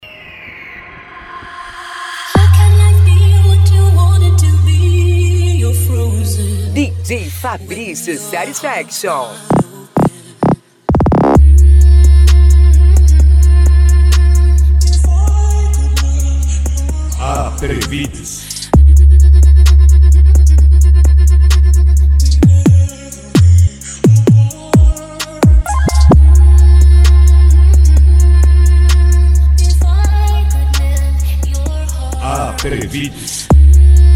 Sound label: funk mega bass